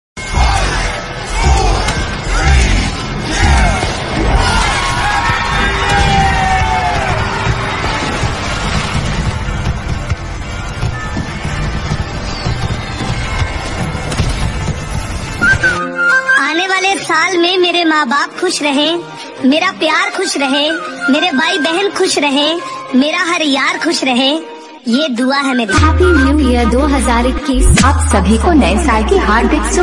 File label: Happy New Year Wishing Song Ringtone